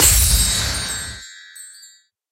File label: multiline win; Great Book Of Magic Deluxe; Wazdan